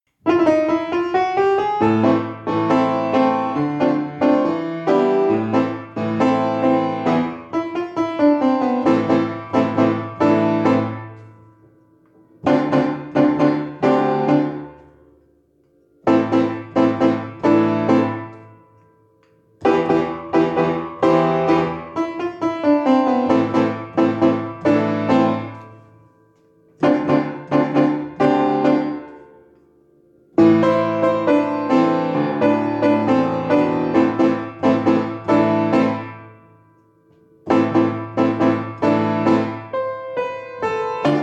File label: Tango piano backing track